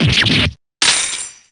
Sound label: damage